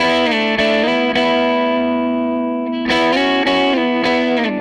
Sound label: SG Guitar Loop